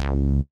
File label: C#3 bass